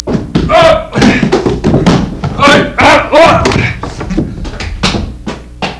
MAN FALL

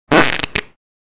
fart; rutti e scoregge

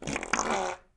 fart mix; rutti e scoregge

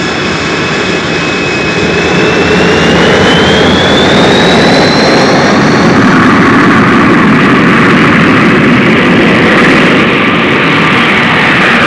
CONCORDE, Aerei; trasporti

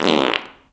FART, cartoons

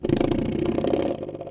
cartoon run; cartoons

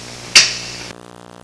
SPITTING

